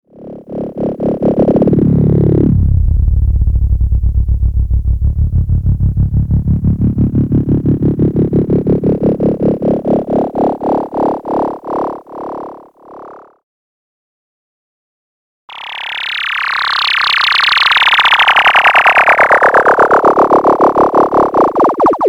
EMS Logik

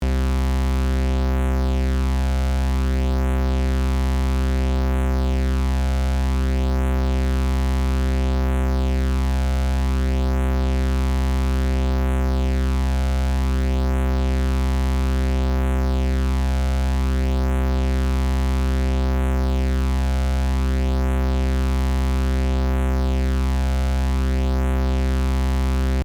Pseudo Phaser

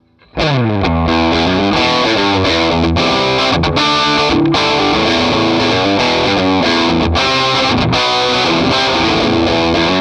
Crunch

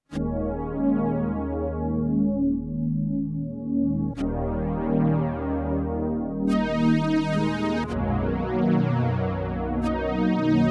OBX

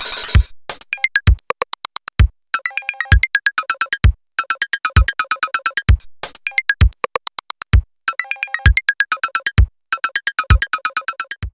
Erica Synths Pico Pitch

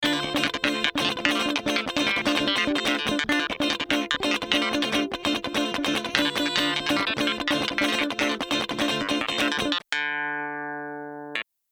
Guit Clav comp; Cameleon Labs 7721

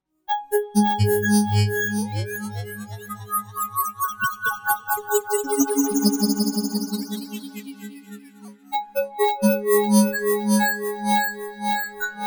Montage

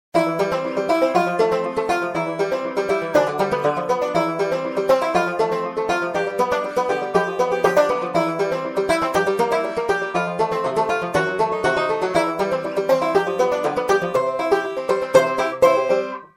Picking Banjo

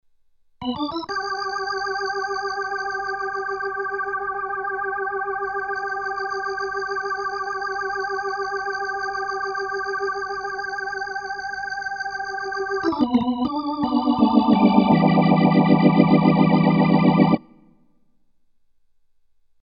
Kawai MP7SE Drawbar Settings

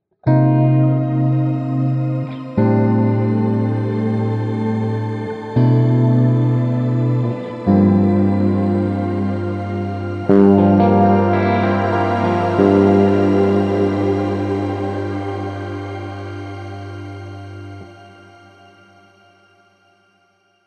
clean shimmer; Ampero

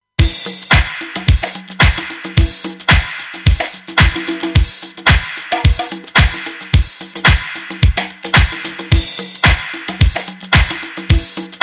Mastering Limiter Beat Limiter; Bettermaker V2

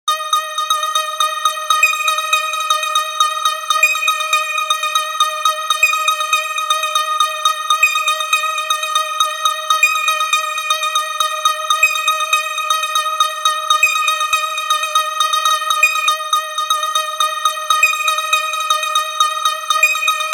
arpeggio madness; oberkorn3

